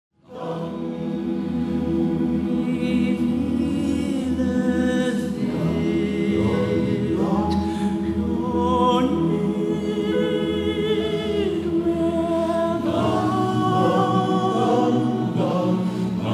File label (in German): Abendglocken kurz